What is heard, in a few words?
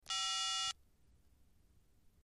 Telephone Cell Phone